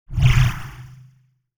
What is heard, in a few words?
mining ship warp